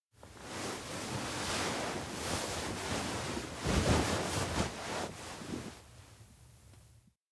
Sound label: futon wake reluctant; anemoi